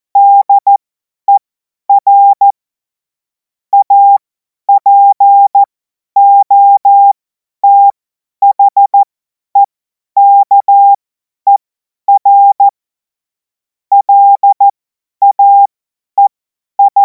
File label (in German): Witz, Morsekurs, cw morsen; hamradio; cw witze